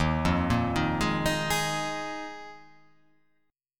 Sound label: D sharp Suspended 2nd Suspended 4th D sharp